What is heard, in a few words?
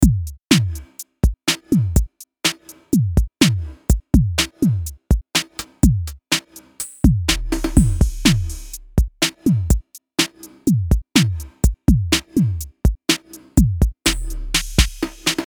Cm124 Funky Groove